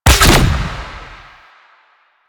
Grenade Shot; Warframe; Lasrian; Lasrian Soldiers; Weapons; Lasrian Chem Nox

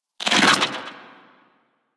Rifle Charged Tail; Warframe; Infested; Quanta

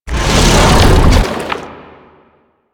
Octopede Lash Limbs Whoosh; Warframe; Man In The Wall

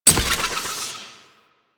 Glyph Prism Hit; Warframe; Duviri; Glyph Puzzle

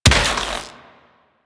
Rifle Shot; Warframe; Infested; Quanta

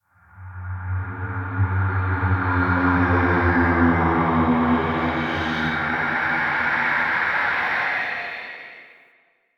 Ghostly Whoosh; Warframe; Man In The Wall

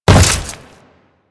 Step Goo, Footsteps; Enemies; Warframe; Grineer

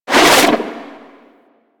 Rifle Retract; DE; Warframe; Weapons; Sentient; Sent Rifle New War

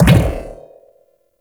shock rifle projectile bounce; Warframe; Weapons; Corpus

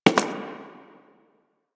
Human Loid Foley Step; Warframe

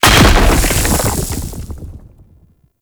Octopede Rock Impact; Warframe; Man In The Wall

